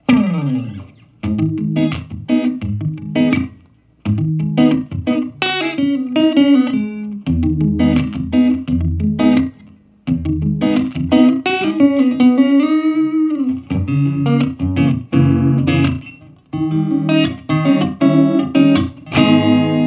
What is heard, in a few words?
Surf like after